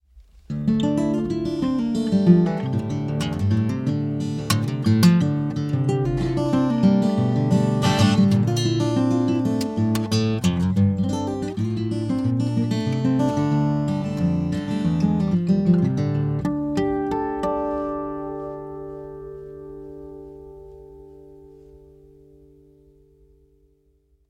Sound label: acoustic guitar mtp840 dm 48v; lewitt